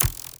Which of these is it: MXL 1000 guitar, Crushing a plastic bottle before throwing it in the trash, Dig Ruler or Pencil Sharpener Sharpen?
Dig Ruler